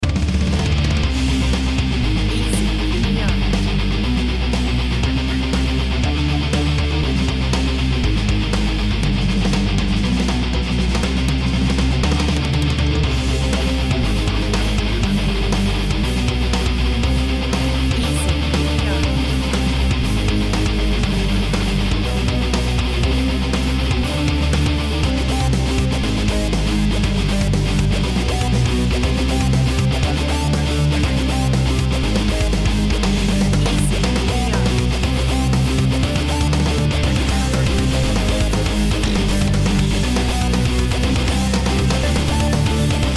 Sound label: speed metal